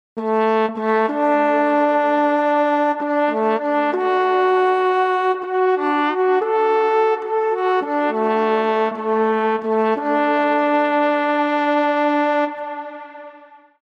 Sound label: alpenhorn